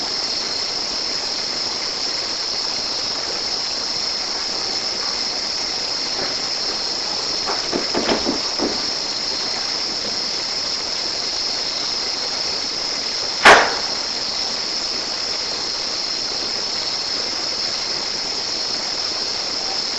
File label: 2nd Bed Room Unexplained sounds when no one is inside AMPLIFIED